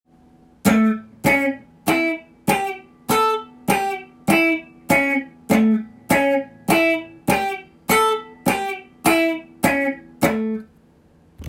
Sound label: chord tone cutting